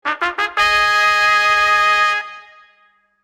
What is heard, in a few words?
high score; croc runner